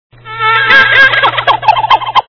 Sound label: beepy